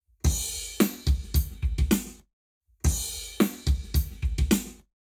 Drums, 0to360deg; Lab Wet

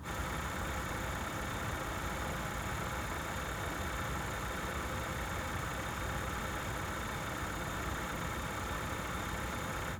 3x Combustion, vehicle

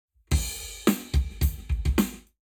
Lab Wet, Drums 0deg

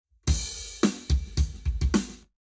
Lab Wet Drums 90deg; eMagLS

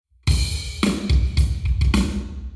Hall Drums 0deg; eMagLS

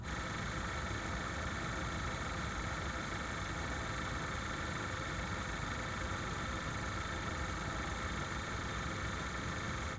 2x Combustion, vehicle

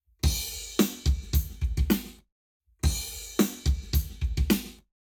Lab Wet Drums 0to360deg; eMagLS

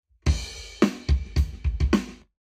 Drums, 0deg; Lab Wet

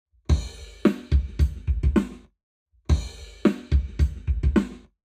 Drums, 0to360deg; Lab Wet

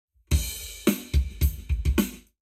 Lab Wet Drums 90deg; eBreve

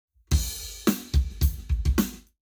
Lab Wet, KEMAR, Drums 90deg

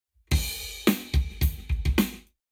Lab Wet, Drums 0deg; eMagLS

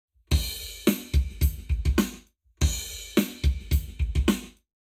Lab Wet Drums 0to360deg; eBreve

